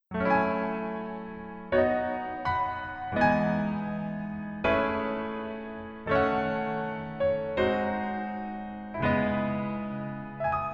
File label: Piano ver; 낭만닥터 김사부